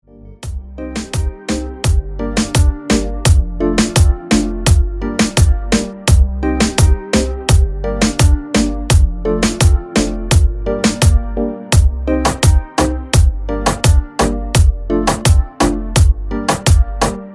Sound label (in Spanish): beat de reggaeton instrumental; gratis sin copyright; uso libre; atmosfera tropicale